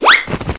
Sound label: Ostrich